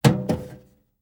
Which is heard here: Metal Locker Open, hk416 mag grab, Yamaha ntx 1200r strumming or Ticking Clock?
Metal Locker Open